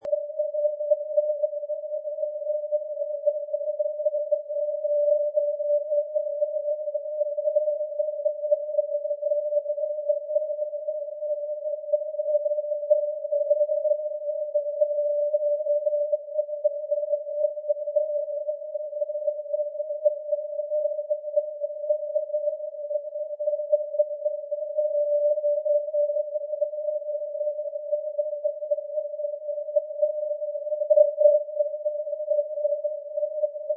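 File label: non-directional beacon; europe; brno-turany; ndb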